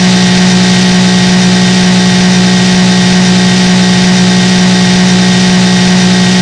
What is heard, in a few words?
engine